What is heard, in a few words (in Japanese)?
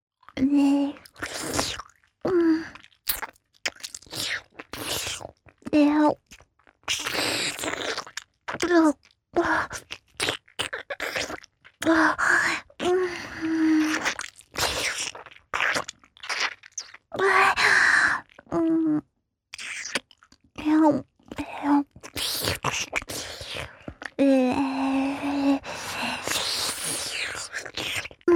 フェラチオ(弱):包皮の被った亀頭・陰茎を舐める, チュパ音